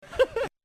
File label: laugh